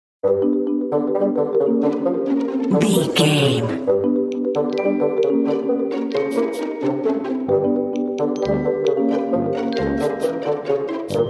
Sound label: suspicious sneaking narrative